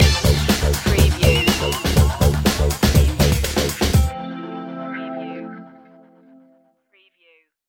funky future stinger

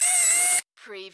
Servo Panel Sound - Servo

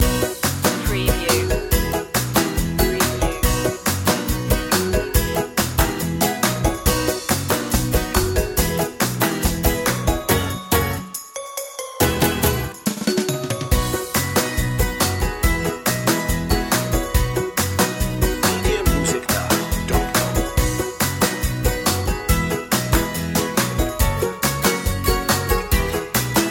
keep it simple loop

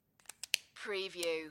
pen cap off on

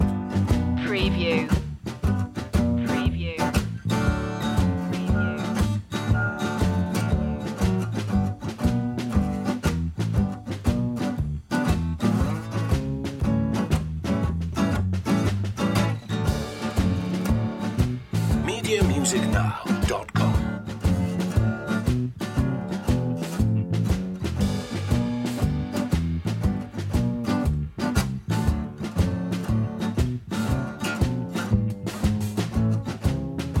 barbed wire blues loop

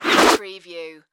Arrow Whoosh

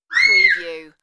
Child Scream

